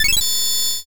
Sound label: fullcombo